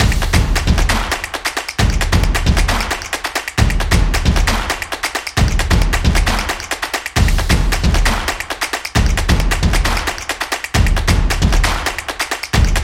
Stomps

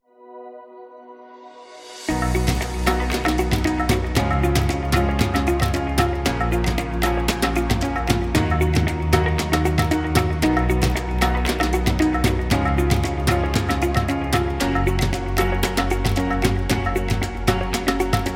Beautiful India

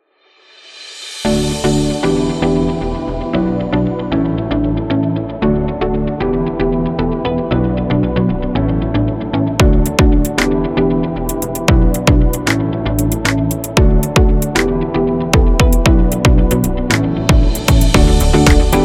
Elevator

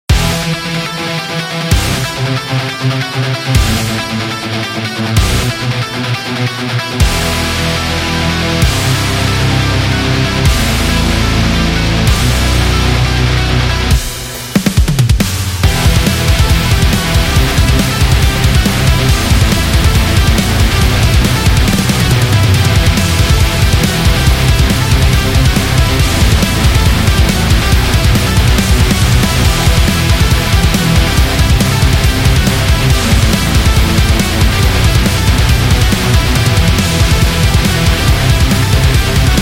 Heavy Metal Loop

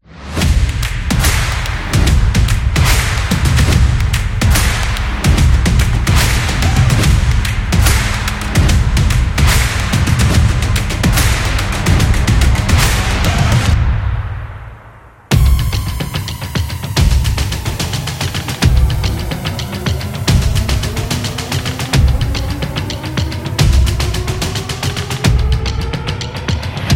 Stomp Intro

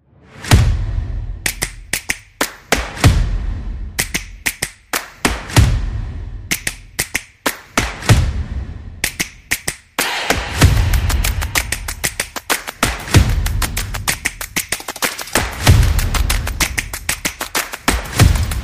Stomp Promo

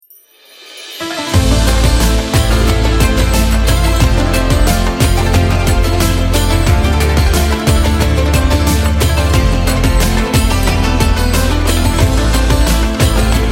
Arab

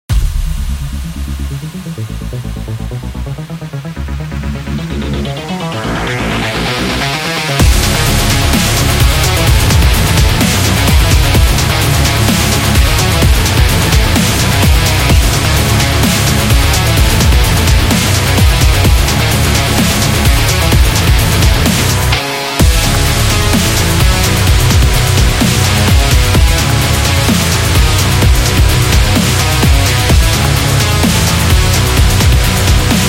Rock Dubstep

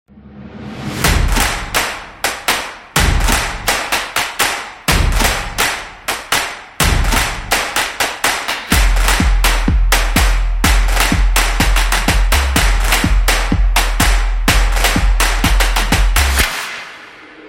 Team Spirit

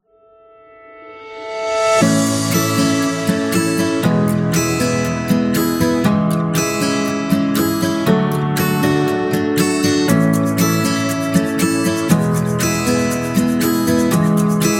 Dreamy, love story

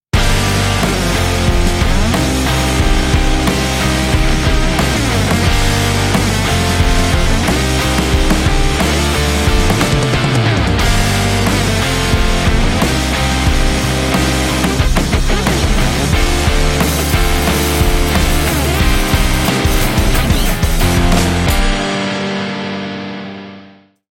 Start Blues Logo; blues intros

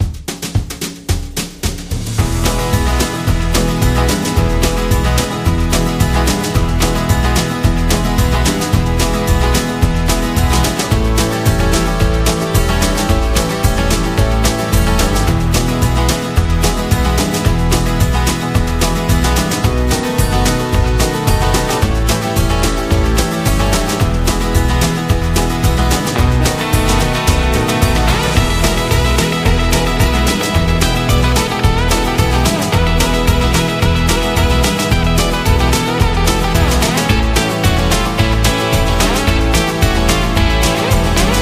Upbeat Country

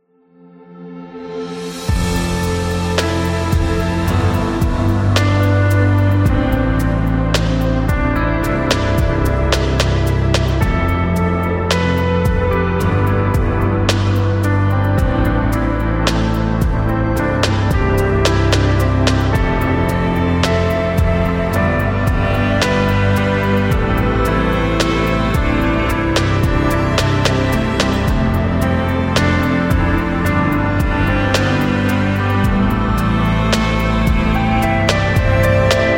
Post Rock

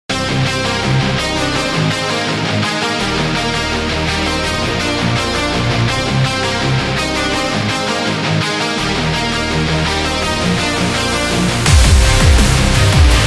Champion Power

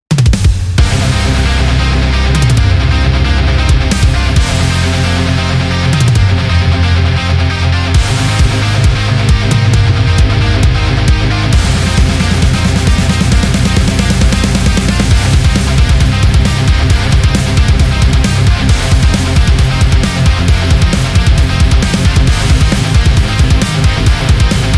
A Heavy Metal

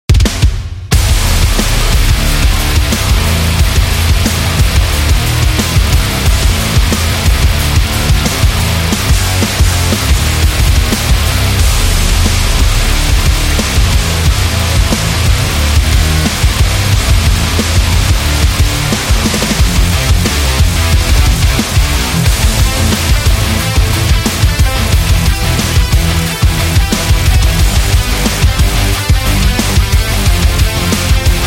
Military

